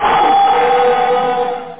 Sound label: WOLF; alienbreed3d2